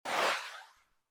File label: mail send